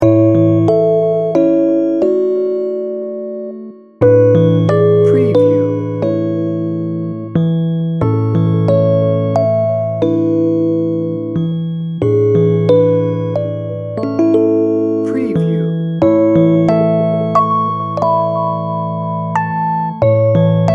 rock a bye baby piano only

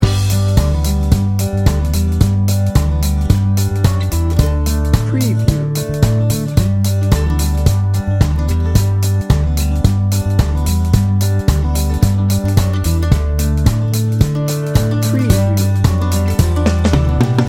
nashville dreamin loop

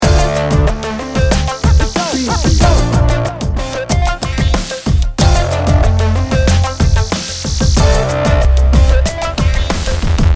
funky chill hip hop loop

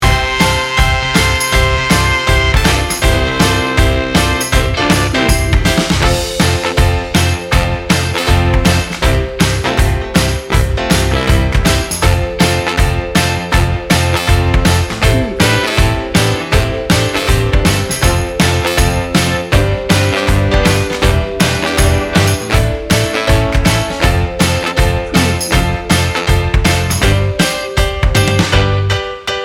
sunny in motown

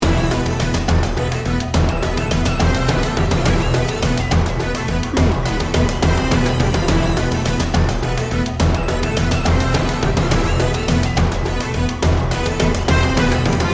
battle lines loop

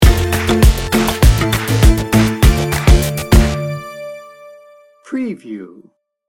friendly vibes sting bumper

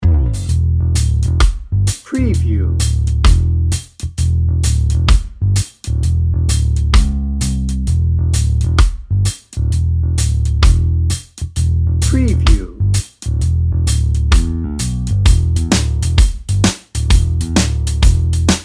day trippin

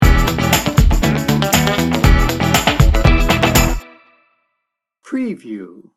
funky lickin good sting bumper

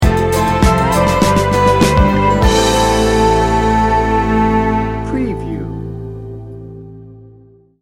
sting bumper

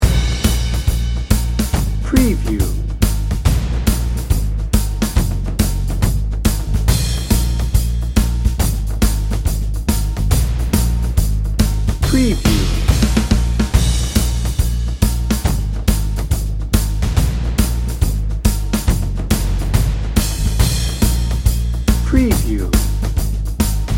triumphant warrior dnb

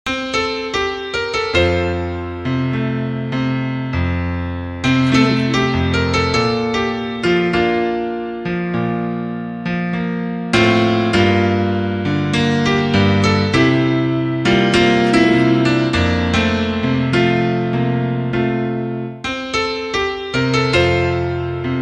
passion and romance piano only

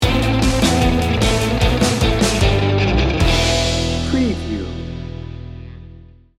sunshine surfer sting bumper

